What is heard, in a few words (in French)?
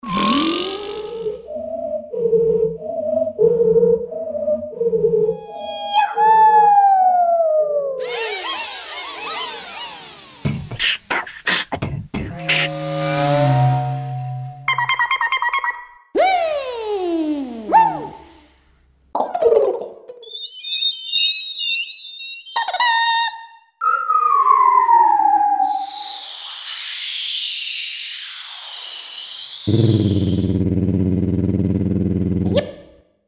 montage bruitages; virtools